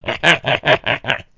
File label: Pig Laugh; Angry Birds Space